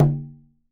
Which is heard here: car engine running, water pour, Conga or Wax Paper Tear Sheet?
Conga